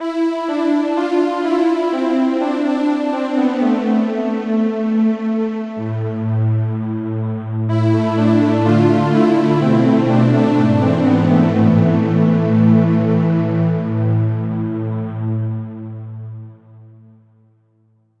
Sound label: halo pad